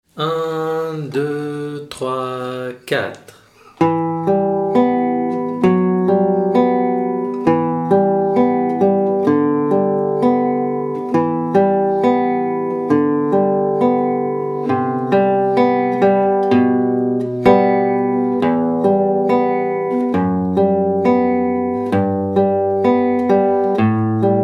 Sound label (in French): Arpèges, guitare classique